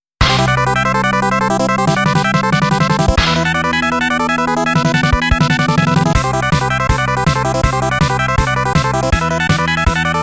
All Clear; Super Rom Cassette Disc in Taito Vol. 2

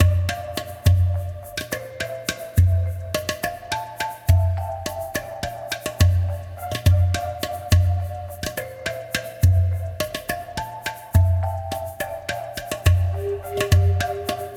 soundtrack mystic Garden foggy Forest; Castle on the Coast; PS4

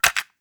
Cassette Rattle

